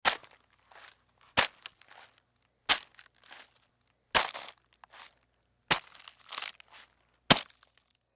Food Lettuce Chop Cut Slice Multiple Times Vegetable; Foley; Blastwave